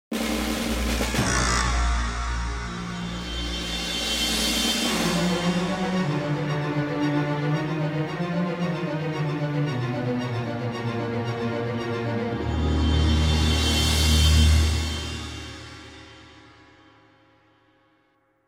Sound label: Egyptian Hits Psycho Strings; Yu-Gi-Oh! Duel Monsters